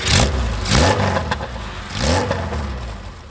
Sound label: CONTACT, MOTOS; East West - Global Sounds